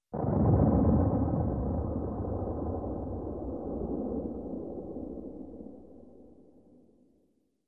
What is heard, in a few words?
Bomb explosion shockwave